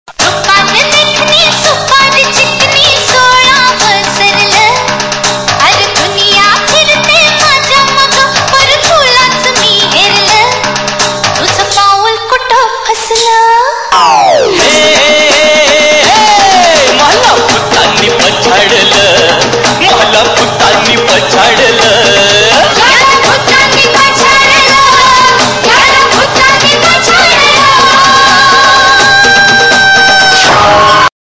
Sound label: Marathi Ringtone